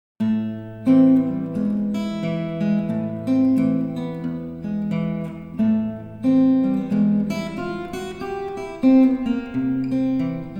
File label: hesitation blues